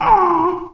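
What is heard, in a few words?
ZOMBIE HURT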